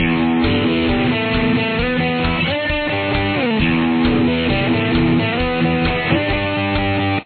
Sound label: solo